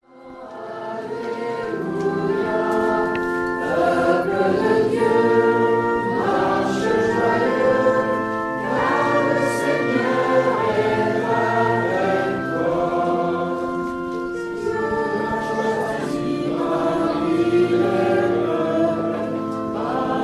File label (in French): chant entree